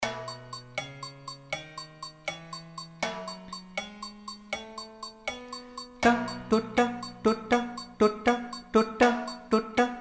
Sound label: yoruba loop